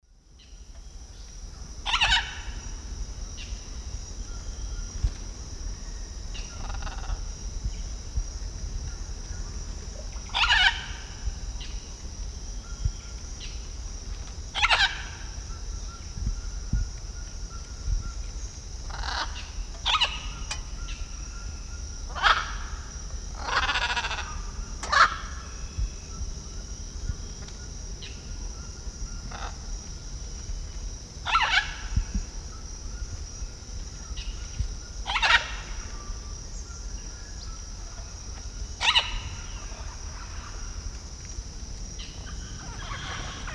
Ara ararauna, calls; S. America; Eastern Lowlands

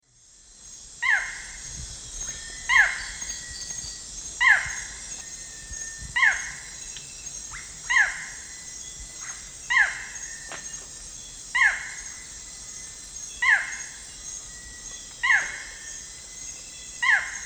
Micrastur ruficollis, song; S. America; Eastern Lowlands

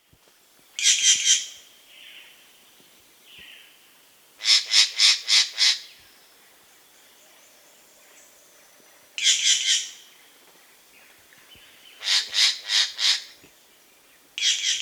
Green Jay (Cyanocorax Yncas), Andean Birds; S. America

